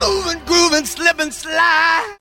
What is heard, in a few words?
moving grooving slipping slide SUNG; Voice; actions